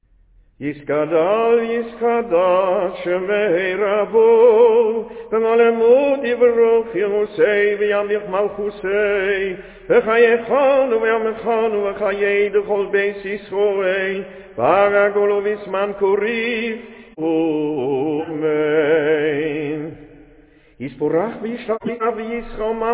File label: Kaddish; shelifnej Borkhu; trad.